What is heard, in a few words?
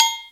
Cowbell L, Yamaha TG-33; Drum Samples